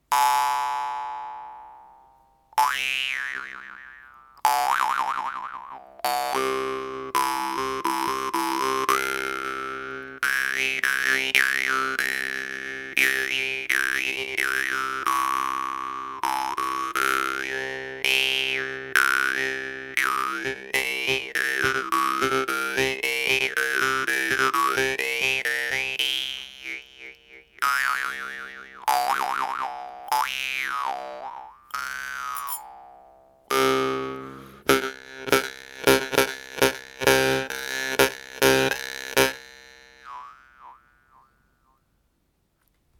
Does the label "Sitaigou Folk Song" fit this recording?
No